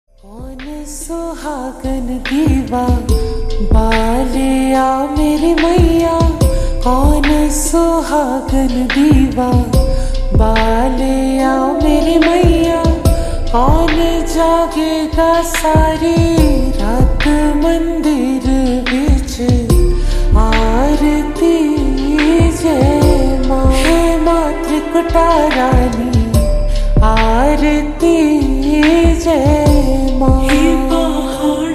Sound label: Slowed Reverb Ringtone